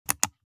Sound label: Logitech mouse click